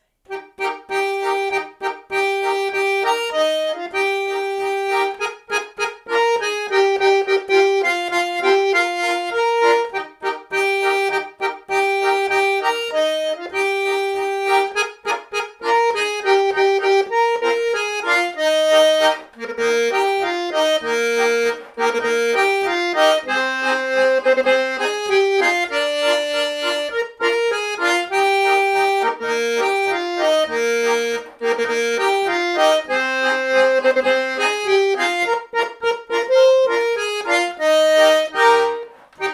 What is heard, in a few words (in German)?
Interpretation Oergeli